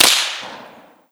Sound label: snap